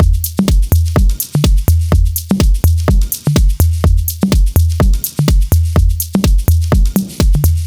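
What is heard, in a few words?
Minimal 808, disco house; диско хаус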